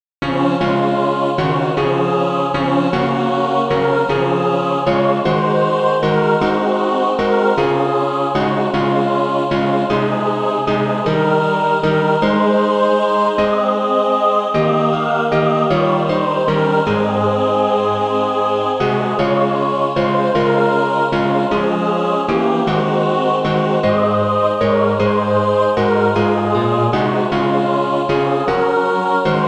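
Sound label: mixed, SATB, Practise Music; Crown Him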